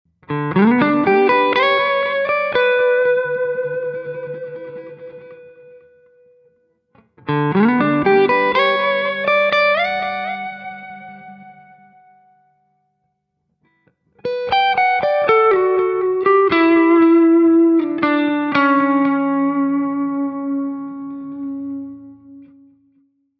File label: Guitars Black Sound